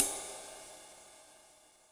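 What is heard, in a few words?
Sizzle Dark, Yamaha DTXpress Cymbal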